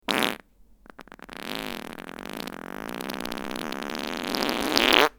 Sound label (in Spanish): Pedo